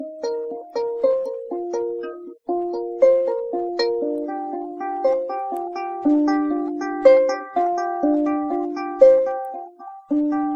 uke jam; weeklybeats-2014

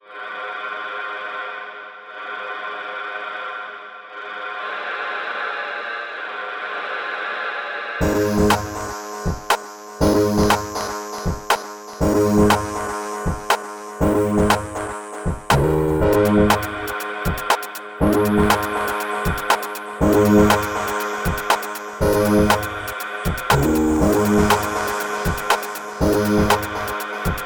taffy piano

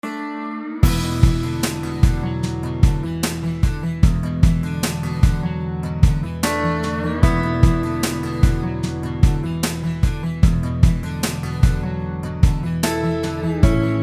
blues; weeklybeats 2024

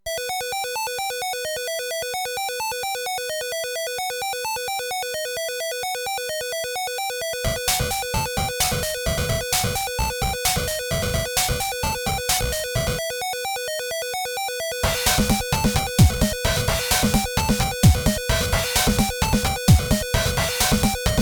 nes tracker; juan chip spill; weeklybeats 2026

